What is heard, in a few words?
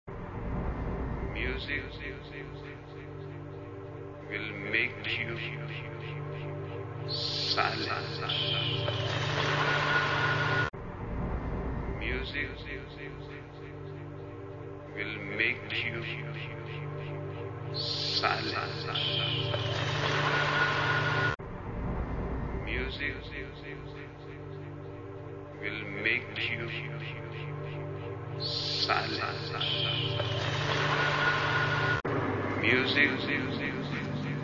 just making noise